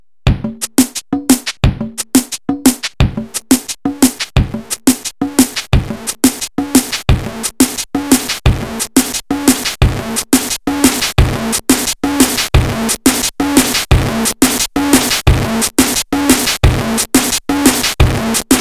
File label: Casio Beat in Delay in Hall; FieldKitFX; Casio Beat SRR